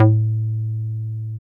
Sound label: BASS; E-Lab; X Static Goldmine 1